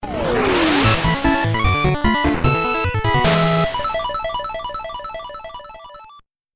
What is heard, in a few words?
Goal; eversion